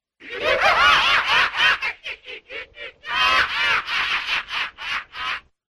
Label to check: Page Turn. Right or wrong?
Wrong